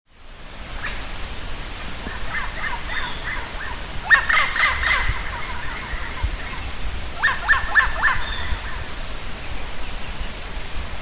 Amazona rhodocorytha Chauá

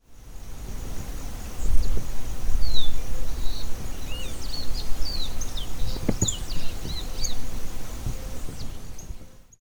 Sporophila bouvreuil Caboclinho

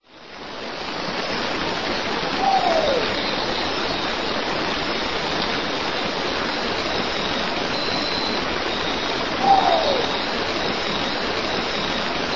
Nyctibius aethereus Urutau pardo

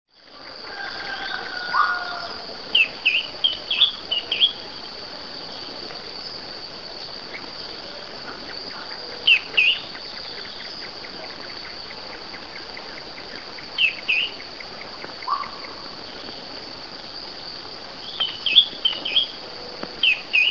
Drymophila ferruginea Trovoada

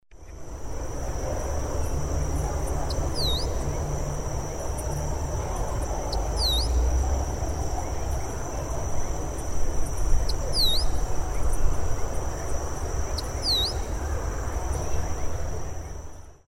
Hydropsalis maculicauda Bacurau de rabo maculado

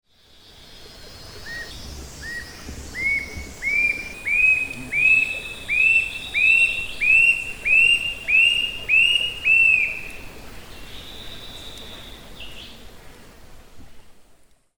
Attila rufus Capitão de saíra

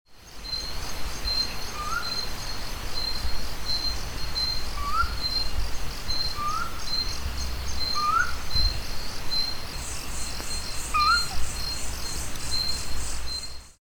Lurocalis semitorquatus Tuju

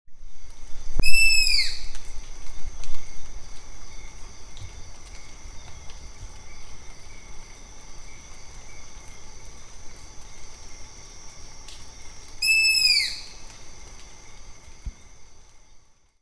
Asio clamator Coruja orelhuda

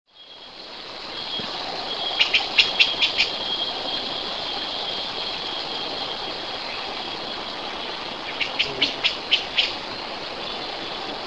Habia rubica Tiê de bando

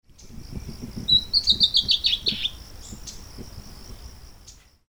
Drymophila rubricollis Trovoada de bertoni

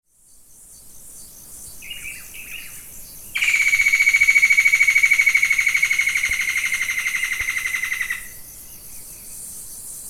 Batara cinerea Matracão

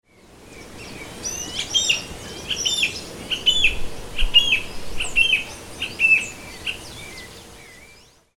Xiphocolaptes albicollis Arapaçu de garganta branca

